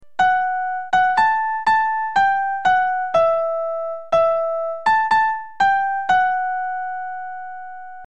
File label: calypso light